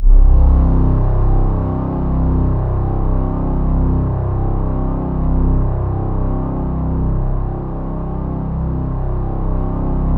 C1, Synth Leads

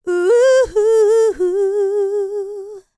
LICKS, Vocal, R&B; ILIO; Dance; LT R+B

